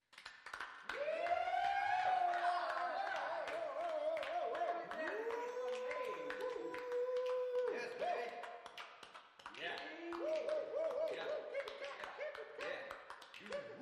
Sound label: Jazz Club